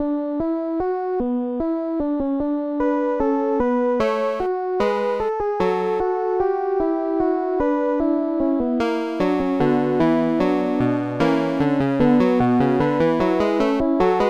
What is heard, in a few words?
fugue, paino; Digital Symphony Collection